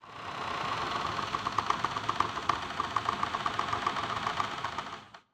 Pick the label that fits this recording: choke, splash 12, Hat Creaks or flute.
Hat Creaks